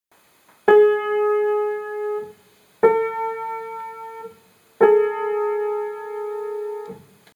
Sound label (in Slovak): klavir